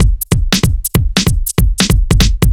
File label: Heavy Swing 95 C, 95bpm; off the grid